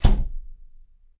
Close Door; Game rip; MiSide

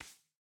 Hand Step; Game rip; MiSide

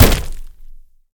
Door Close Meat; MiSide

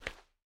Barefoot; MiSide

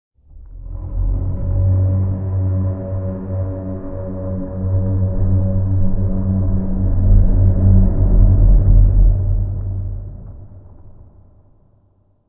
Long Call; Game rip; MiSide

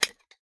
Sugar Cap Drop; MiSide

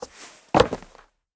Jump; MiSide

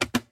Teapot Drop; Game rip; MiSide

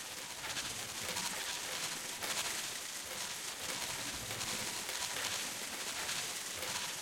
Glitch Furniture; MiSide

